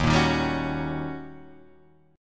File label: D sharp 7th Sharp 9th, Guitar, Open D